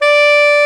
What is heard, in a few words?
ALTO SAX D5; Greytsounds VOL-1